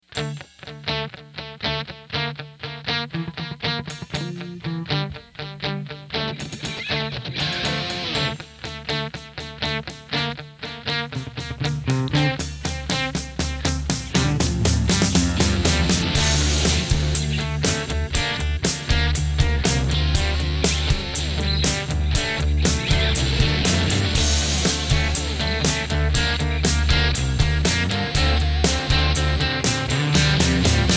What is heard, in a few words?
rock guitar